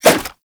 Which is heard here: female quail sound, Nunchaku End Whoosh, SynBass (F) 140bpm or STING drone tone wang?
Nunchaku End Whoosh